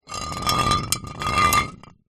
bottle wine drag movement pebblecrete; zapsplat

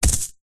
horror yeti monster footstep single heavy; zapsplat

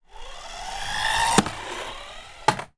toy car radio controlled drive bash into object

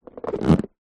balloon rubs squeaks hands

